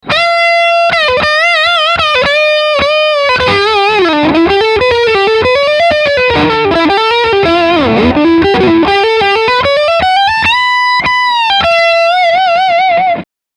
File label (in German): Lick Steg HB